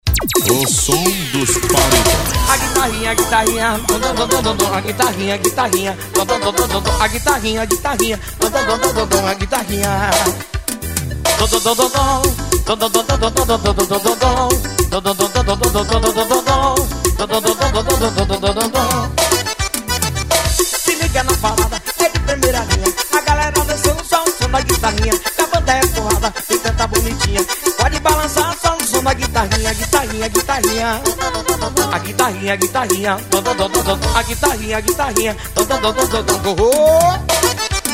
guitarrinha

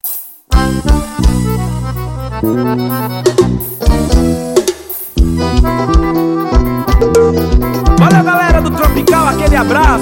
demostracao intrumental da banda

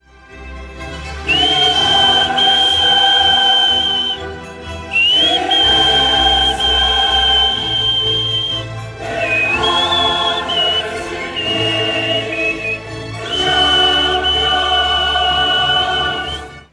abertura no assobio

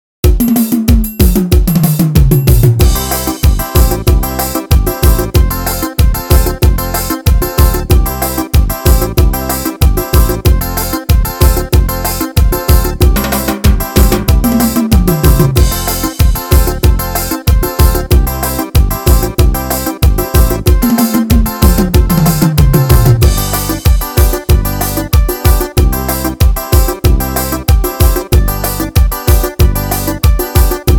ritimo yamaha forro

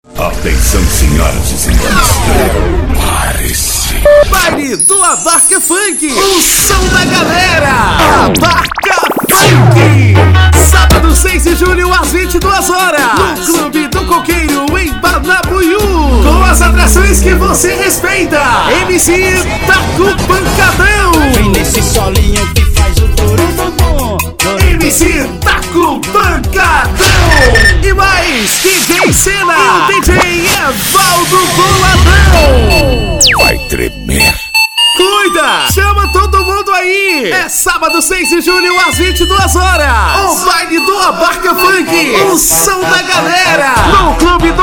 spot funk; abarca funk no club do coqueiro em banabuiu junho

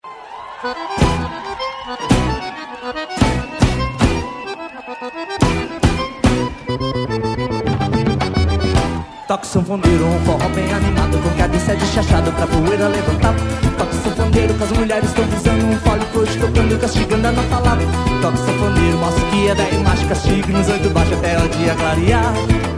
toque de fole

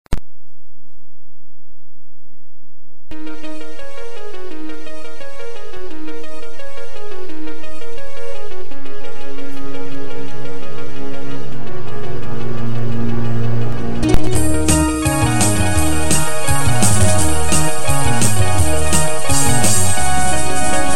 rock chines

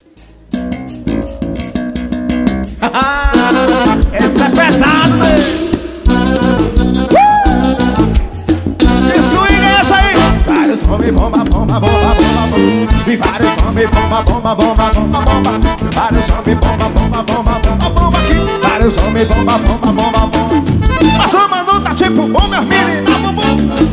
xote ao vivo

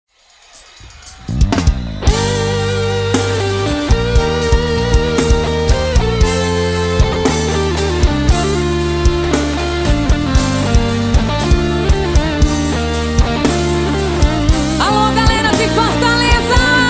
abertura forro; cavalo de pau em fortaleza

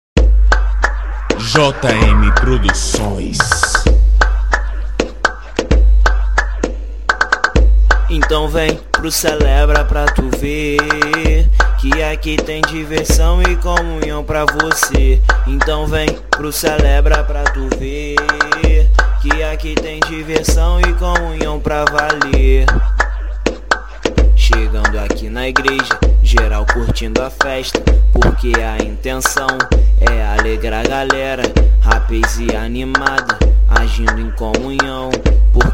vem pro celebra funk gospel

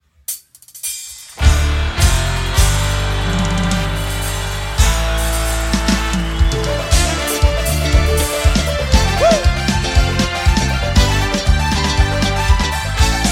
solos de abertura

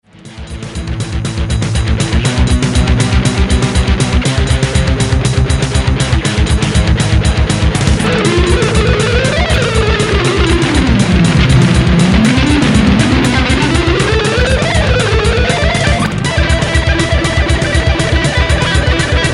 lick velocidade

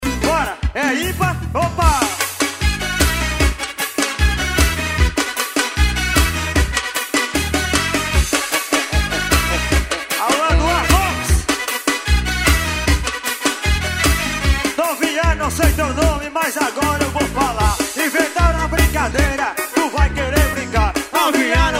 forro ao vivo

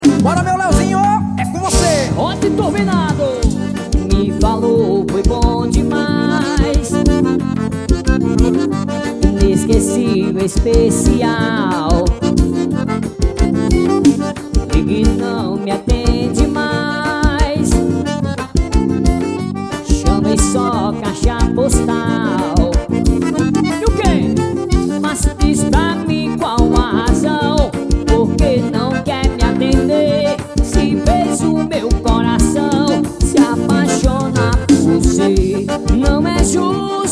xote; wm music

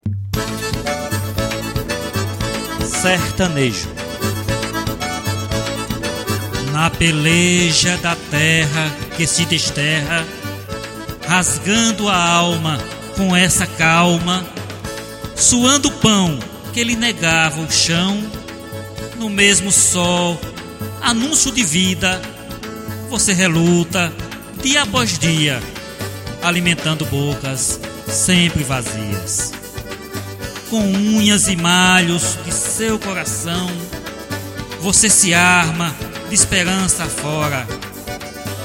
sertanejo

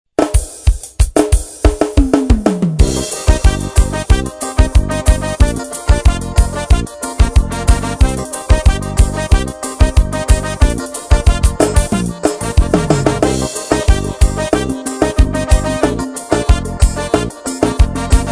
ritmos forro

